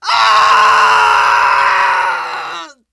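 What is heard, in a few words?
Scout pain critical death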